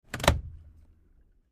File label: Boat Throttle Movement; Ranger Boat